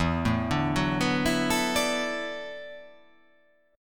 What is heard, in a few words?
E 9th Suspended 4th E down